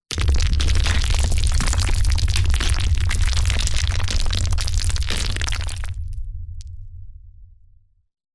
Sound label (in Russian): kamnepad moshhnyj